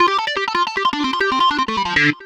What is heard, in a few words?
Synth Riff D